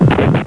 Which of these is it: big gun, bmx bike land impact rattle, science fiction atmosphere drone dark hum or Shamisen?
big gun